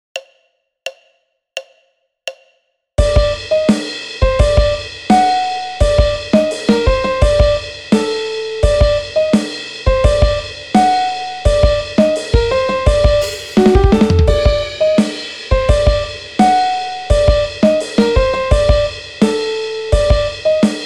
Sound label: PIANO RIFF